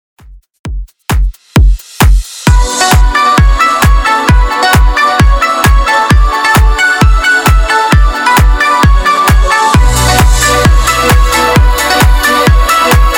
90s Redrum 132bpm

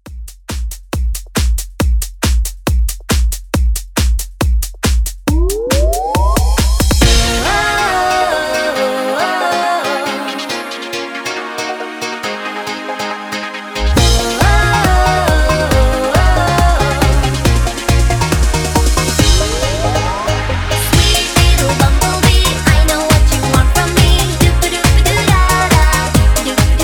90s Redrum

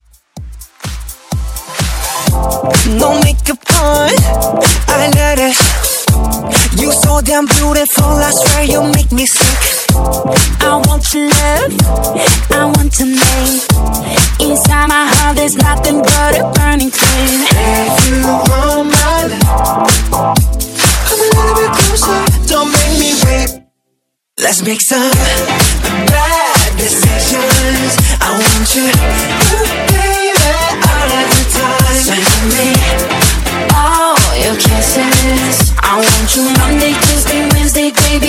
Top40 Dance Re Drum, 126 Bpm

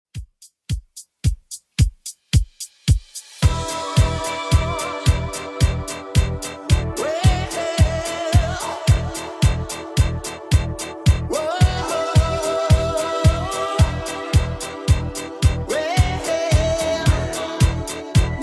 80s Redrum 110bpm CLEAN

